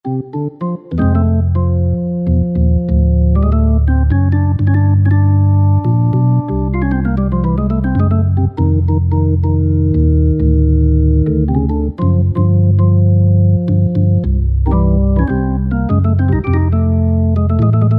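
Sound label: organ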